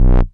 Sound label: Bass Multi Rev H; Vengeance Samples